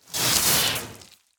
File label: Melee Impact Electric; Grounder; Evolve; Kraken